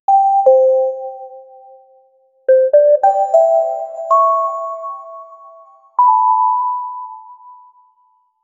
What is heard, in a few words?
app error message; attention